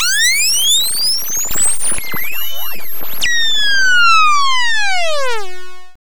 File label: SYNTH